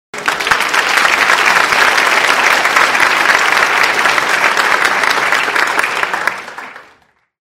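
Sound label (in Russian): звук аплодисментов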